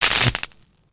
spark

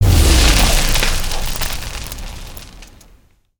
Ice Tornado End Blast; Spinjitsu

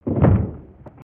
Lava Rumbles

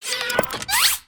Broombot Idle, creatures mechanical; Inventor Broombot

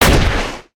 jump att impact; weapons; aronwk collection; ndaudio

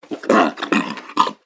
Pets Lion Happy; creatures; Lego

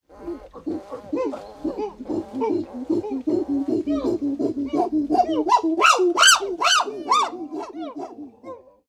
Jungle Primates; gnarled forest

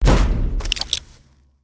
hedgehog smash; destructibles

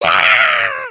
freakout; emotes

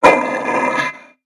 Pet Dog Robotic Angry; creatures pets; Lego

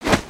cloth; creatures organic

